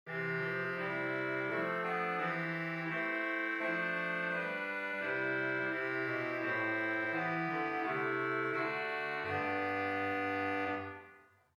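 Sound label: Swell Clarinet 8, organ; St Annes Moseley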